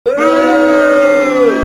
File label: SFX Musician Failure; Life is a Game; Android